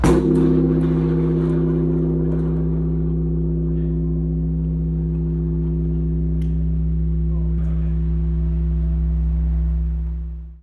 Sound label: K Bell, Korean Bell, Seoul; Korean Bell, Seoul 10"; Infinity Room